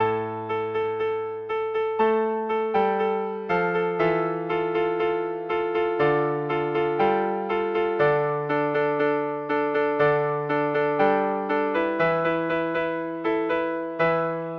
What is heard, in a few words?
haunting piano